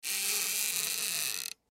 Metal Handle Turn, Metal Handle Turn Knob Slow Squeak; Foley; Metal Doors; Blastwave